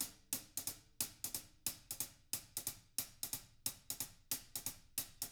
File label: HIHAT LOOPS, Living Drums